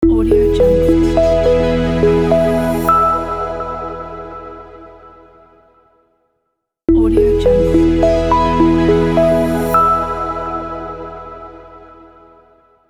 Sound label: minimal air logo